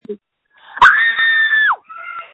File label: Scream, phone